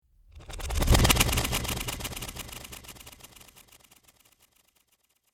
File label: Whoosh Transition SciFi Slow Motion Flutter; Whoosh Transition Slow Build Metallic Female Vocal Element; Whooshes; Sound Design